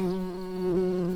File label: bumblebees, Hypnorum workers